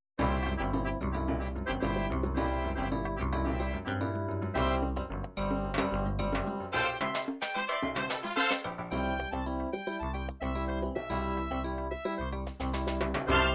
latin cumbia, midi

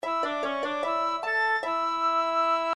whistle, midi; nintendo zelda ii

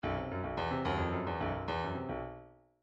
midi grooves funk

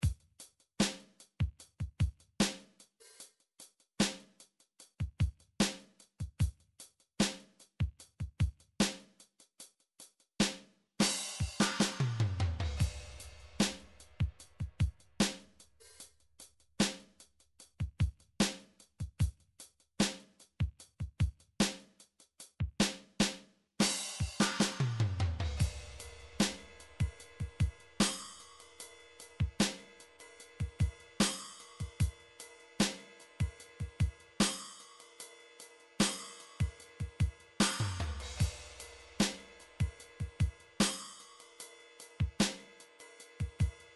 drum midi beat; Drum Midi Files Collection

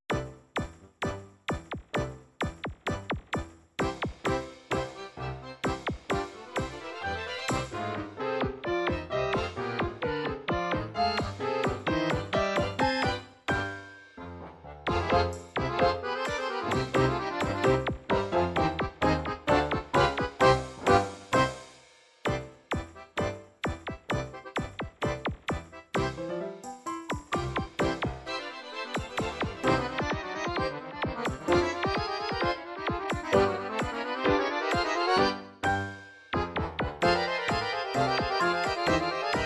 tango

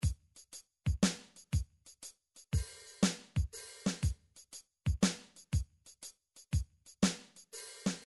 midi drum patterns drums soul

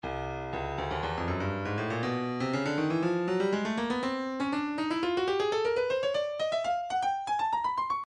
breakbeat 060 bpm; Stylus Breakbeat Midi Files 060 064 Bpm; Timba A

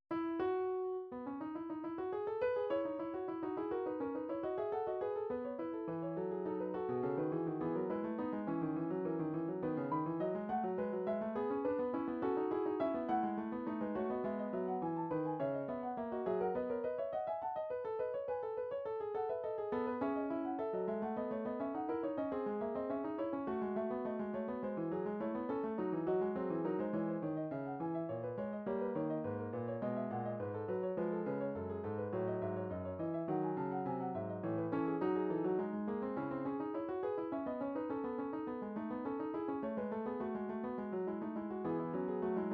classical fugue, midi

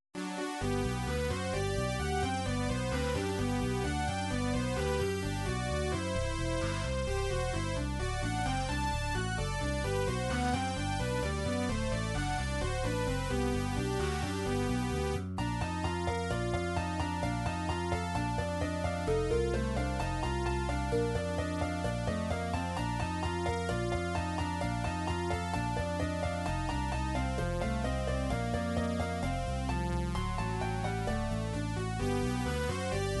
underwater; Nintendo Adventure Island 2

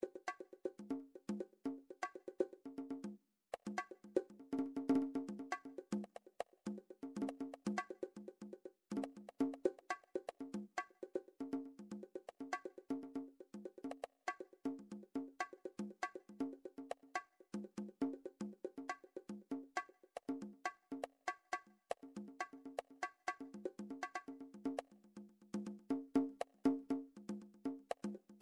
midi drums percussion; Midi Vol 4

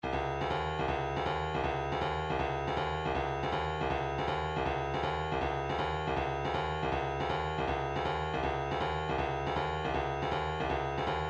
doumbek, midi

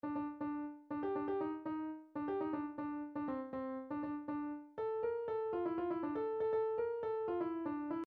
bulgarian, midi

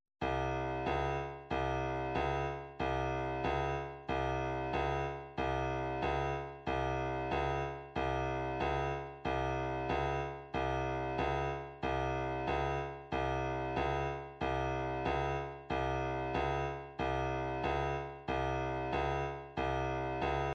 120 tambourine; Slide

